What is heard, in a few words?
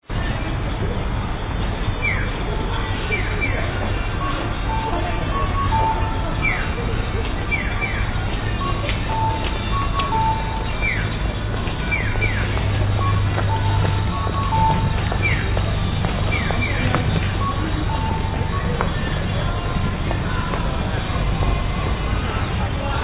viola; hama; tamachi